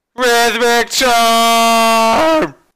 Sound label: rhythmic charm